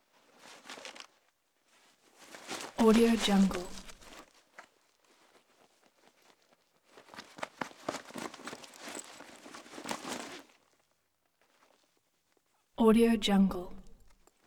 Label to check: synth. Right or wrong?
Wrong